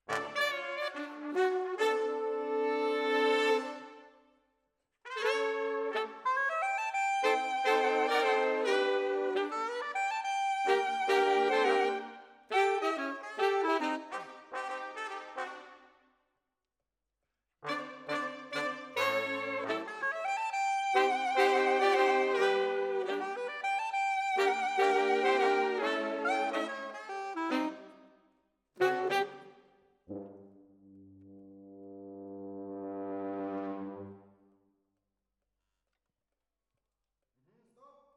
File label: Sax